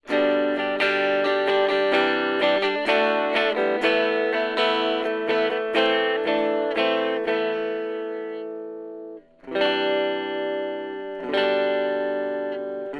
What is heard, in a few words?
clean; fluid audio strum buddy